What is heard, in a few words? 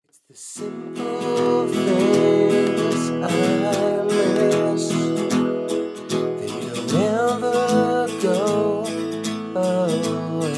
scratch